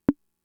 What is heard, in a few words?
Conga, Fricke MFB-522